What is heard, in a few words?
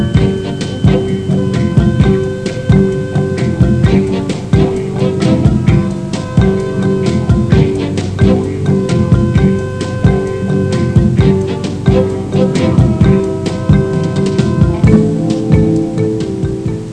suspense